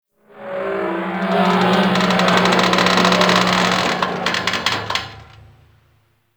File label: Ship High Metal Creak; Warframe; Corpus Ship Remaster